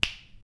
snap fingers

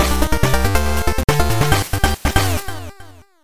Victory Jingle; Blue Shift; Eijukugo Target 1000 DS

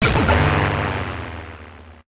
Huge enemy destroyed; Gradius; MSX

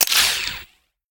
Ui Button Back; angry birds star wars 2 android ios 2013

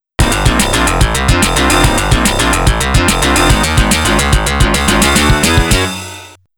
STAGE CLEAR; snk arcade sound digital collection vol. 01

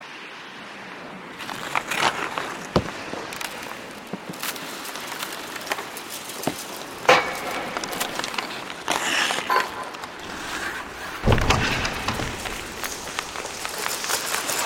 Region Background; Frosticons; Calling All Mixels; 2014 mobile gamerip

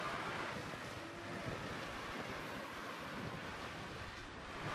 Down Slide

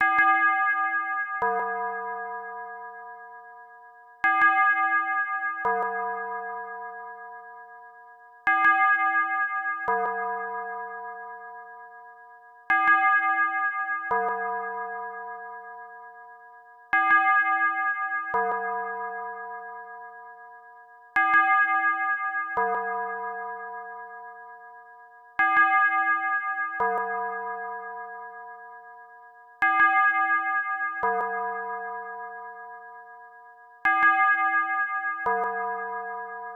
Call Bell; meikyuu gakuensai kyuukousha no nazo pc 9801

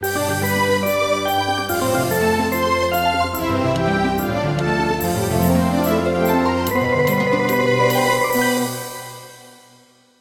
All Clear

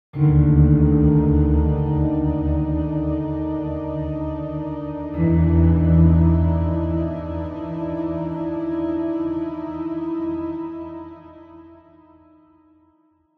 Dark piano Sample

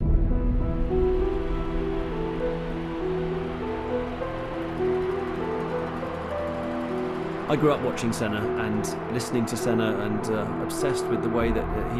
Load Loop; project cars 2 2017; ps4; Race to Win

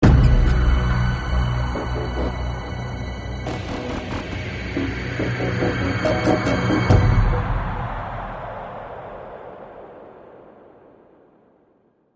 Suspense; tales from the borderlands gamerip 2015

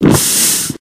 Bellows; Angry Birds

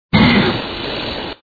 Bowser Fire; Super Mario 63

